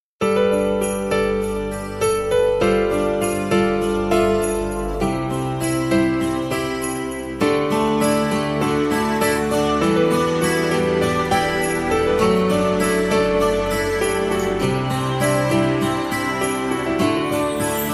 Праздничная Мелодия

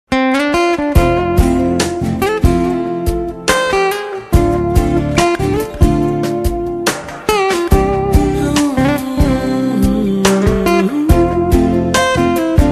Ритм Гитары

